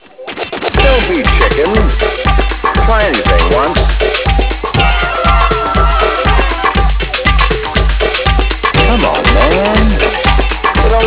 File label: kicks